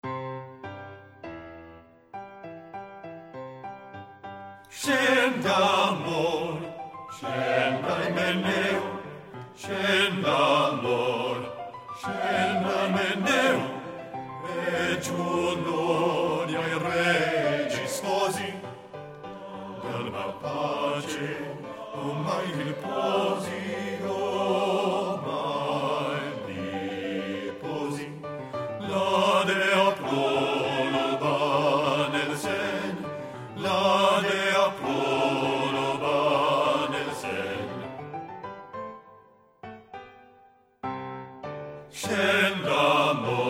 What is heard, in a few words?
BASS; Scenda amor